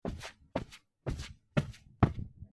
カーペットの上を歩く音

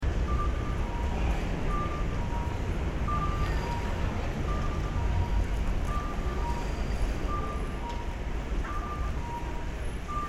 人が多い大きい横断歩道の環境音

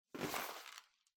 ゴム手袋を取る音

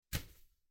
スマホをシーツに投げる音

